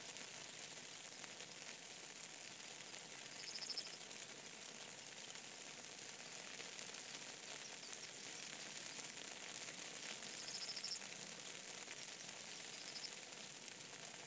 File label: SCOT PASS MORN Rain Bird; The 39 Steps